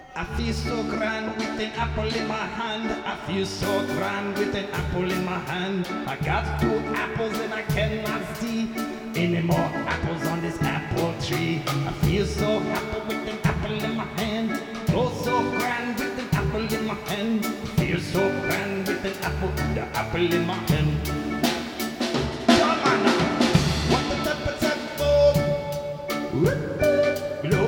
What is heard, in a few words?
Reggae